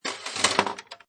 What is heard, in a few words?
Pinball Sound Ball Roll And Passing Object; Video Game Sound Effects